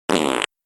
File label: fart